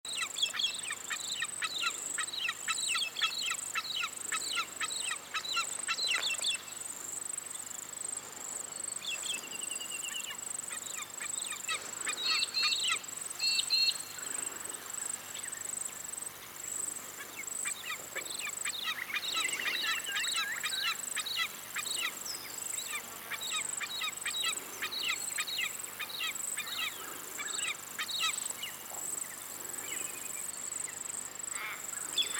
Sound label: Aquila Audax; Wedge-Tailed Eagle; Call